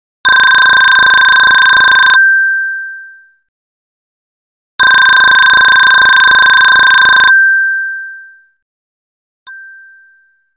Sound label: Telephone